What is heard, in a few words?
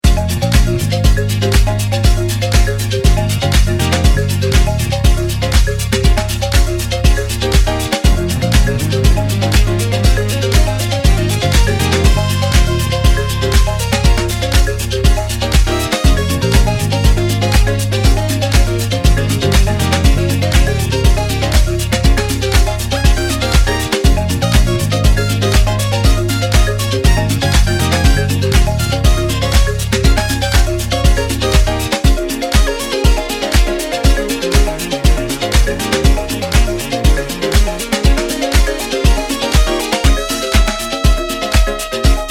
Marimba Disco